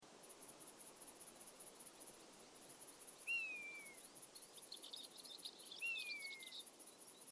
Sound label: bgm